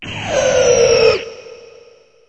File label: death; alienarena; martianenforcer